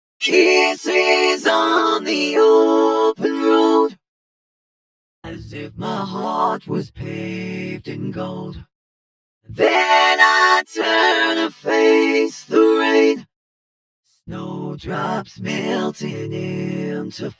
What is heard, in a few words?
vocoder